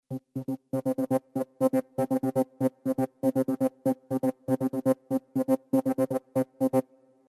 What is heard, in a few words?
Envelope Stutter Trembling Energy; Elysion 2